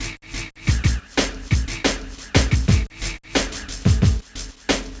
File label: HipHop; Pinky and the Brain The Master Plan; GBA